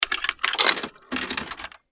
自販機 お金投入

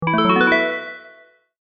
完了

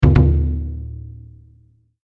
太鼓 2連打

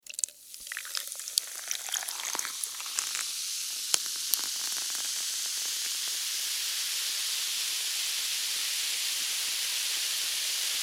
炭酸飲料を注ぐ

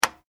鍵 を かける

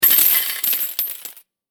小銭をばらまいた音

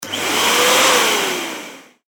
掃除機 短

